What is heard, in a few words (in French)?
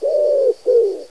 pigeon bizet, chant